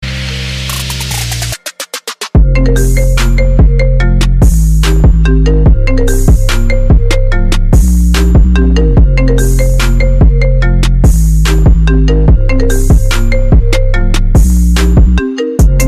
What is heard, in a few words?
iphone trap